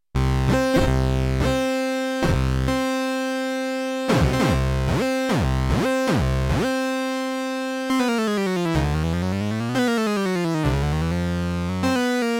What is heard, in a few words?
portamento speed, Kawai sx240